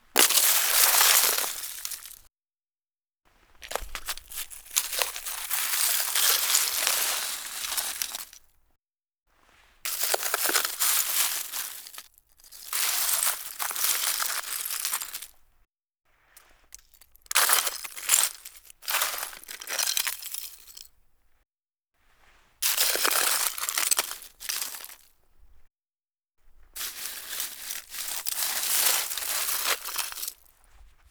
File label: Friction debris snow and ice; SkewSound; Frostbite SFX Library